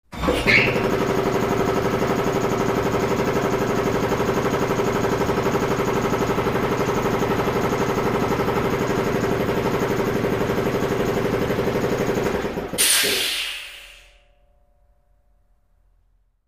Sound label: Air Compressor 1; On, Steady Chugging Motor, Off With Air Release